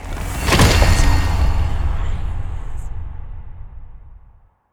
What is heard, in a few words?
mystery Sym Activation; netent